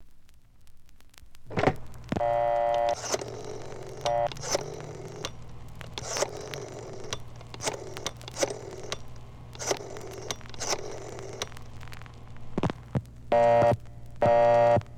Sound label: Phone- Dial Tone, Dialing, Busy Signal